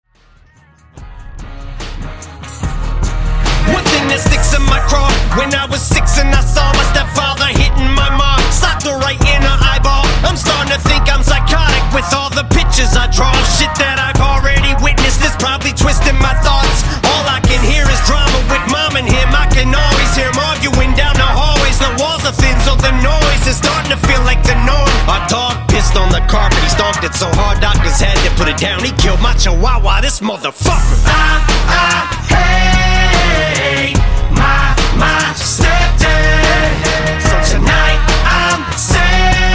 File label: Redrum; Quick Hit; Clean n Dirty